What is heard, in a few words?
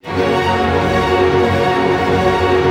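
strings arpeggio major, symphony orchestra; Roland LCDP08; HIT Major Hits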